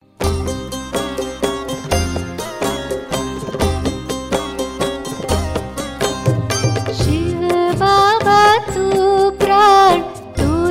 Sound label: marathi tone